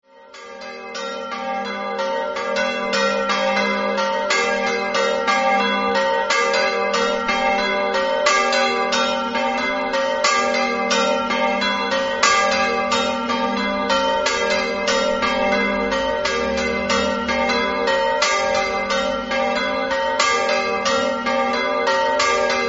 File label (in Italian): campane